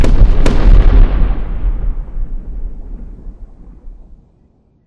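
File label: explosion distant kaboom; corporate; Titanfall 2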